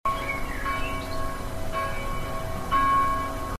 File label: village bells